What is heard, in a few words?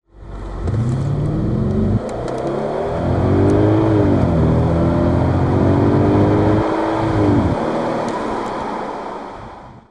RS6, engine sounds; audi